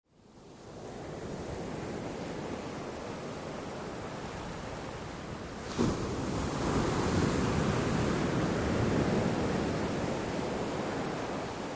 Shore Ambience: Medium-sized Waves Break Off And Roll Up Onto Rock Beach And Each Other